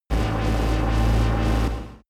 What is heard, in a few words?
space driving construction machine